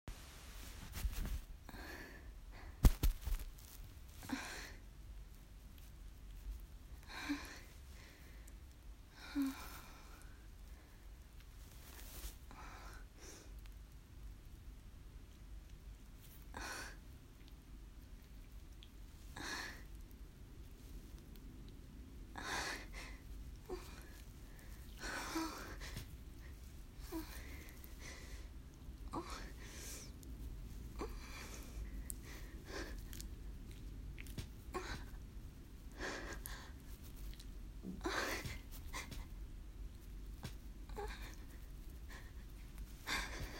Moans and Vibrations, not talking really, bed creaking, sheets shifting; very short; wet noises; squirming